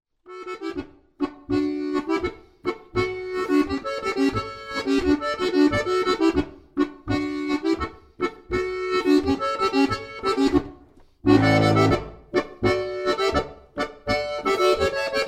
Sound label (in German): boarischer